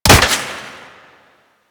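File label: Flak Cannon Projectile Hit; Grineer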